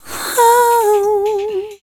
female dance vocals

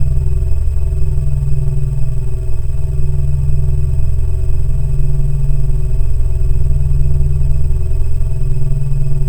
Drone Ambient; Drums & Drones

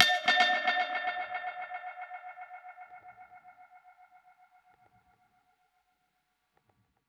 Perc Hit, dub percussion one shots; musicradar

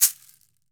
CAXY, CAXIXI; AKAI S-Series CD-ROM Sound Library VOL-5

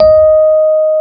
D#4, Electric Pianos; Wizoo

